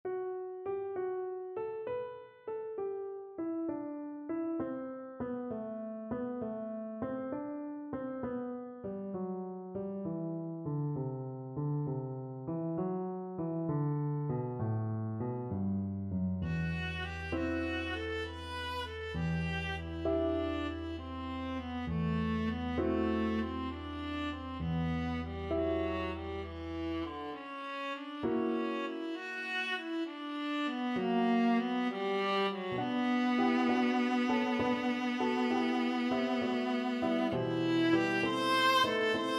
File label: cello concerto, viola